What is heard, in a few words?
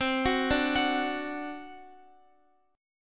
C Suspended 2nd Flat 5th C Guitar; Csus2b5 arpeggiated; x,x,12,11,14,14